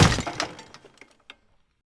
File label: Cannon Drop; Civ4 warlords